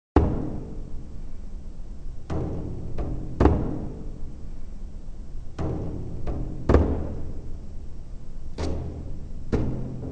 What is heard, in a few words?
bodhran slow